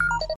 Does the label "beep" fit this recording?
Yes